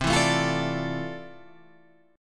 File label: F Major 13th C, guitar chord